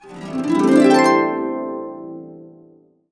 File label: C, Harps; USB Soundscan vol.62; AKAI